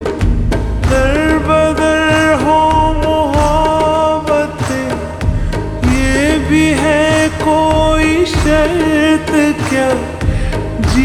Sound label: Ringtone slowed and reverb